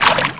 splash; Ferazel's Wand Demo

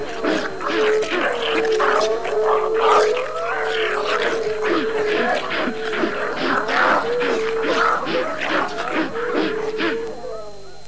snarl, horror

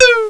Heat Seek Beew; PowerPete Demo

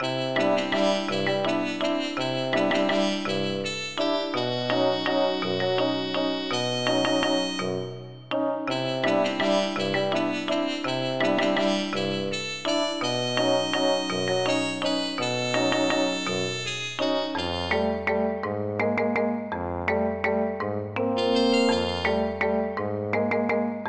tune

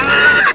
tada; Game Head January 1996; ambush

